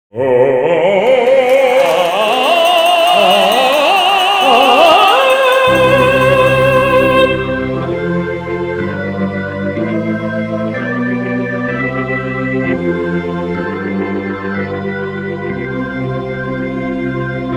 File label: Classical Vocalist